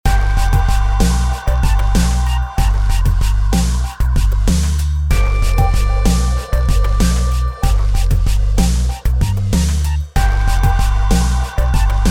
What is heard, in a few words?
Industrial Loop